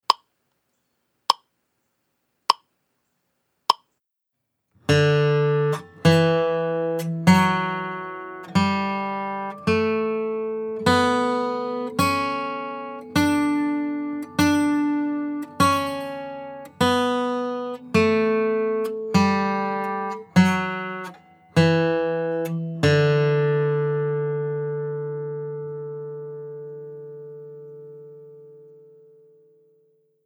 D Scale

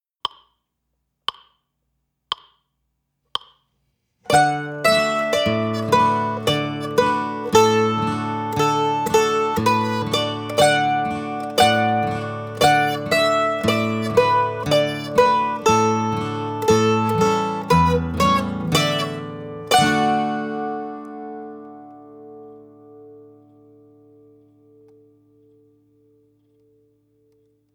Fly Around with Double Stops, Mandolin

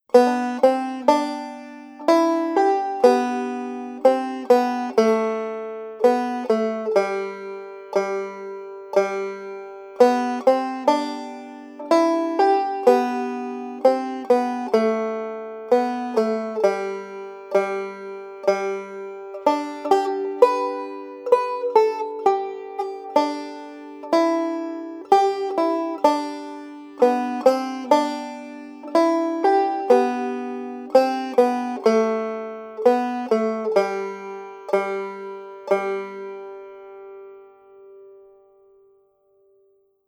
Melody; Wildwood Flower

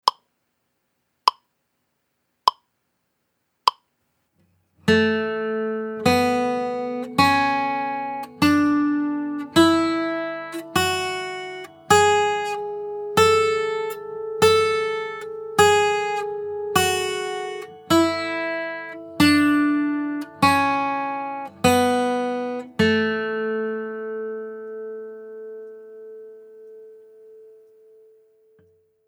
A Scale

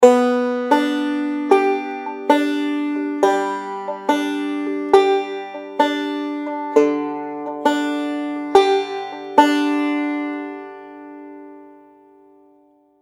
Two Finger Roll

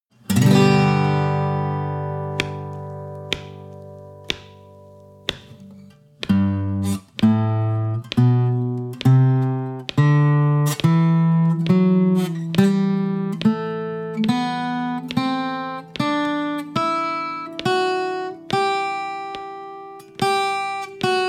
G Scale

